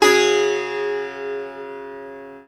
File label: SITAR CHORD; SITAR CHORDS